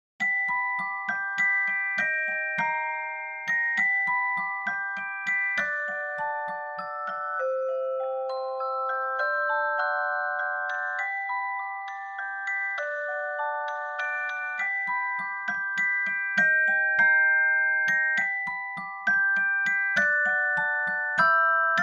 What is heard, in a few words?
celestette church